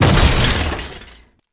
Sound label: car crush